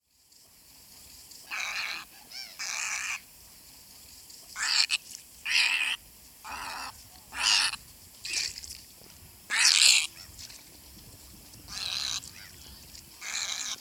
小白鷺

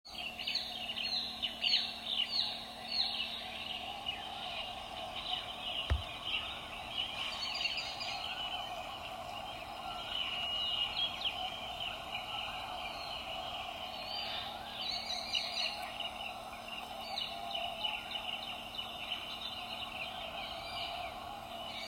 赤腹鷹

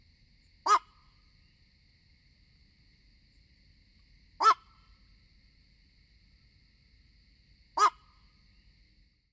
夜鷺

